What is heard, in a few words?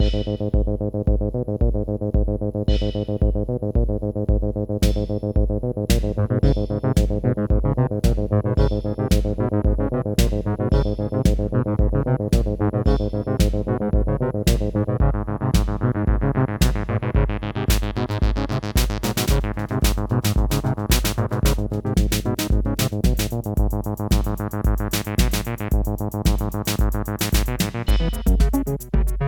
theme